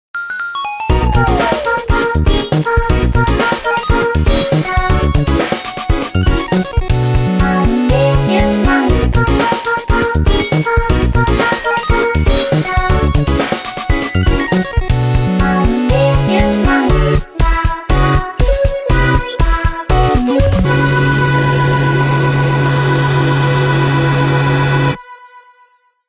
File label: sony xperia